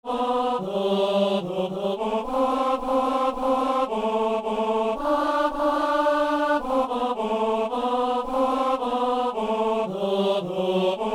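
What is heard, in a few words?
tenor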